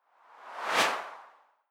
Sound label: whoosh simple high